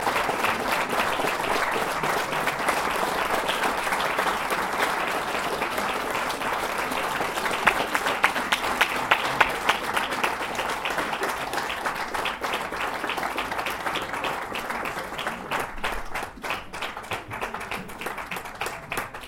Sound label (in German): 1 min Applaus; die filmpremiere des kurzfilms auf den umweltfilmtage